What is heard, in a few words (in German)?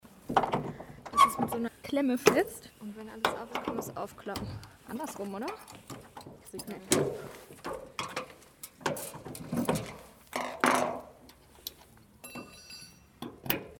Aufbau Geraeusche Stimmen; Sternsingermobil; während des Aufbaus